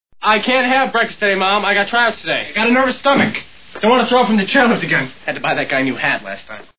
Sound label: throw up